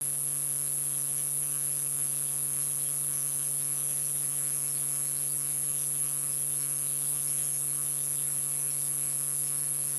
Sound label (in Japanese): kubikirigisu